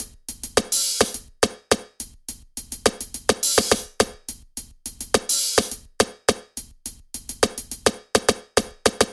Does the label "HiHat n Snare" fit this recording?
Yes